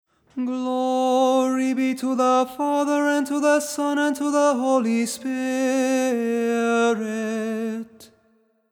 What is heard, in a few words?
Tone 8 glory be